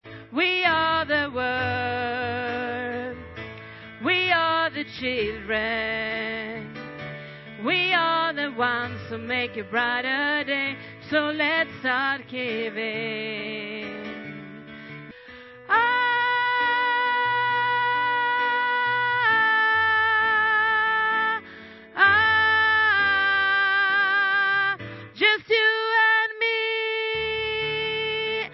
bas, stammor

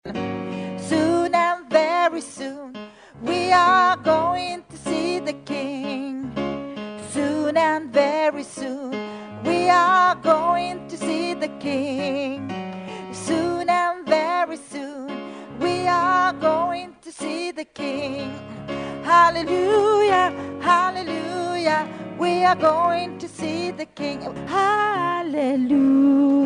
alt, stammor

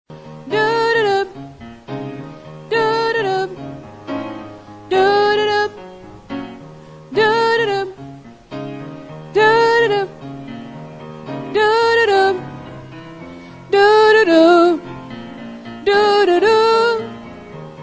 walk this road to glory alt, stämmor

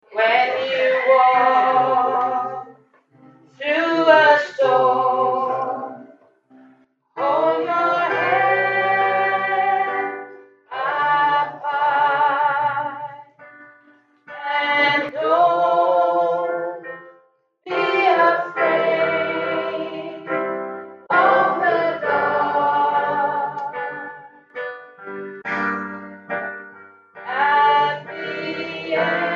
alt, stämmor

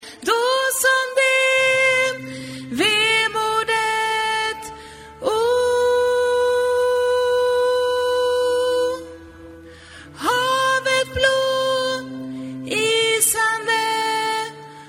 vintersaga bas, stämmor

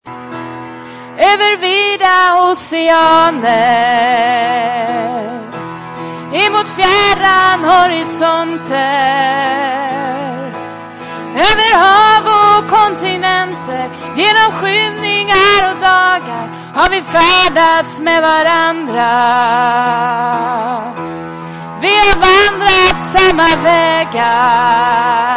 alt

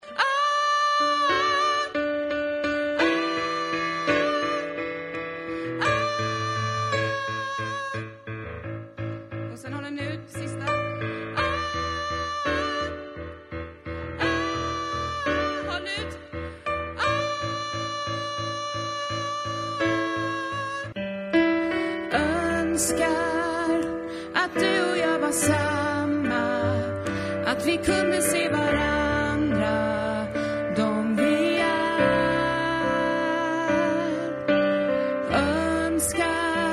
tänk dig tenor, stämmor

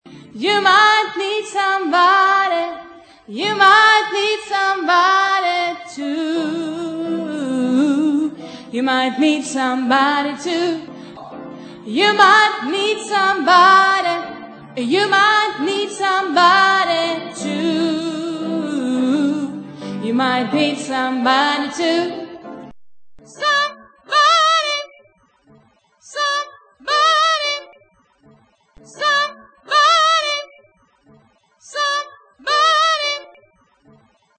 sopran, stämmor

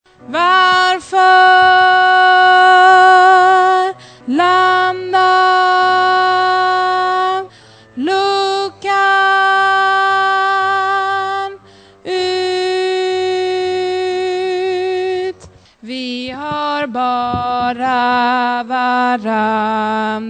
vi har bara varandra bas

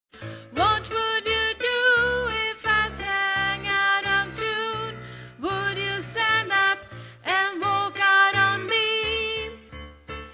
tenor, stammor

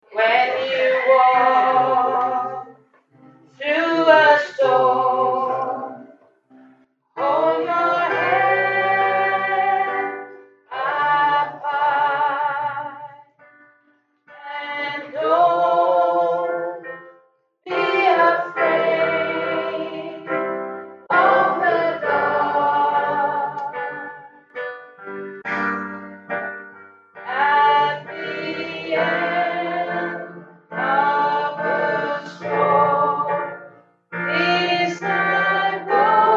sopran, stämmor

